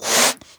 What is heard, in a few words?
inflate, balloon; World Of Goo